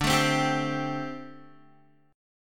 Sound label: D 7th Suspended 4th D Guitar; strummed; JGuitar